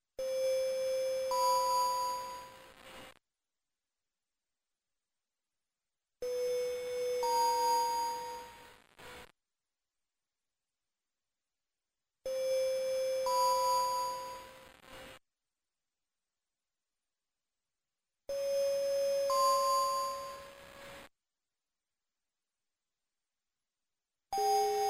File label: After Wreck